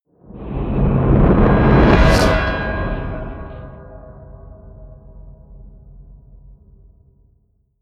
eerie industrial swell; whooshes rises

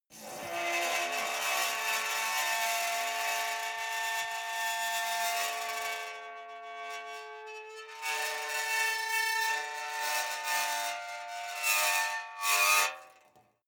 metal squeal sequence

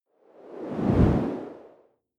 whoosh simple long; swooshes-swishes

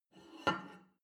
plate take table slide, gastronomie; berufe; soundpool

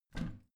bathroom cabinet both open heavy; objekte; tueren

